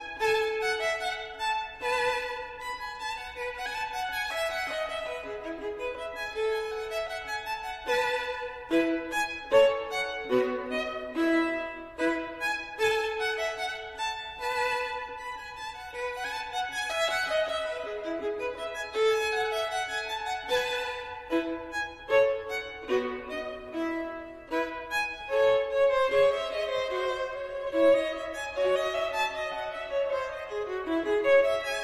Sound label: Gavotte en rondeau, Violin